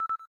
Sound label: cam timer last